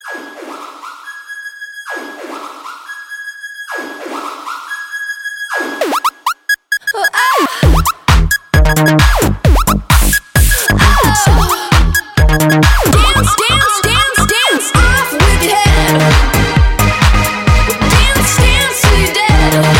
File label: dj electro ringtone